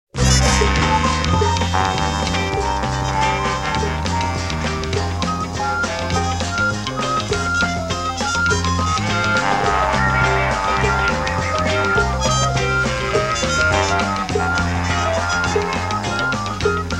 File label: DEEP SUSPENSE; Lupin III '71 ME Tracks